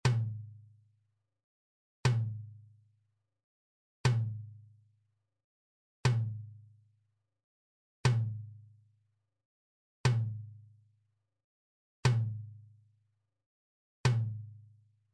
Toque - Surdo Medio; fanfarra

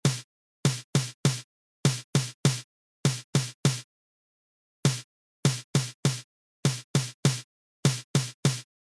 Toque - Repique; fanfarra

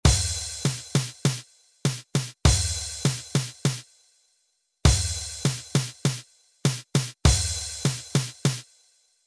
Toque; fanfarra

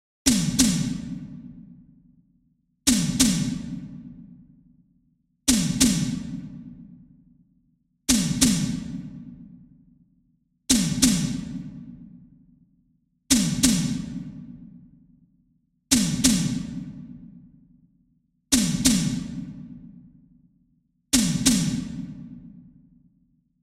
Toque - Surdo Mor; fanfarra

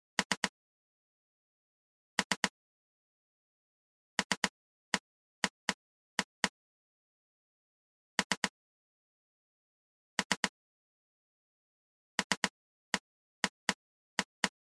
Toque - Caixa; fanfarra

